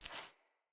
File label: UI Default Theme Select